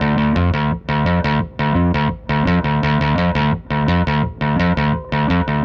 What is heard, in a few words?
Static Bass